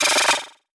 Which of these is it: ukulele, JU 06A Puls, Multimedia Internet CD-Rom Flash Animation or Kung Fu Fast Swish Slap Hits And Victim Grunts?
Multimedia Internet CD-Rom Flash Animation